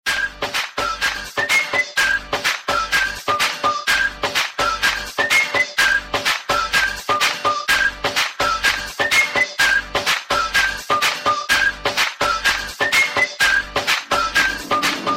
Cute instrument

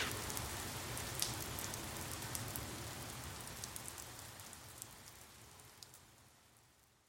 Quiet rain

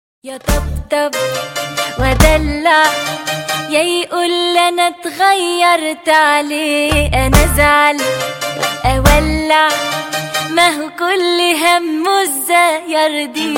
arab ringtone, rock

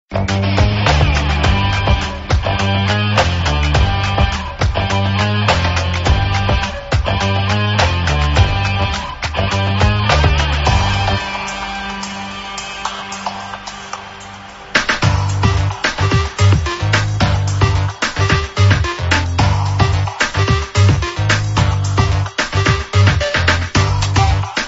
Telugu

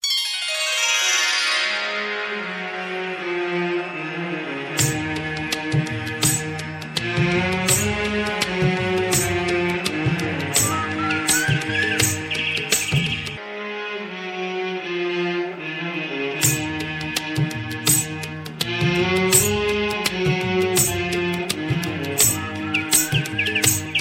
instrumental; classical

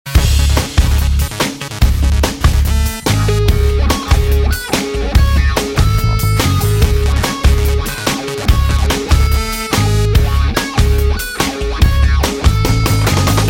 heavy beat

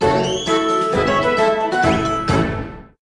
theme; monster hunter

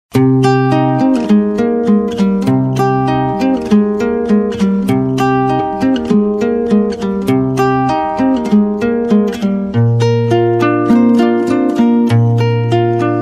gitar

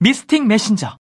Text Tone

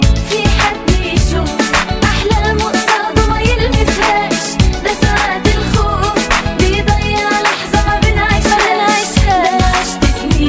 Ska

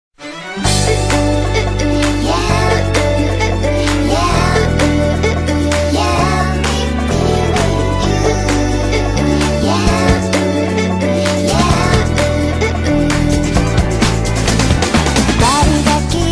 8 bit; hobits OP

